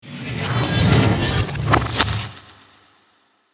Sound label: Time travel reverse whoosh